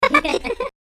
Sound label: Giggle